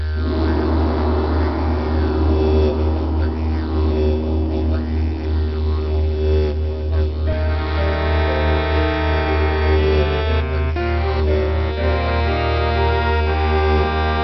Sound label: didge gong